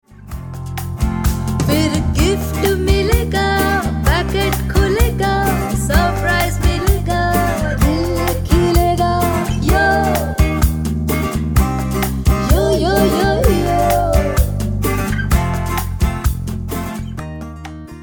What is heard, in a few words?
HAPPY BIRTHDAY TUNE MOM TO CHILDREN HINDI FEMALE